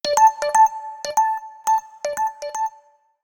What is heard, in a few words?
Repeat, notifications; BLU Studio-5.5-S